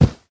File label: Carpet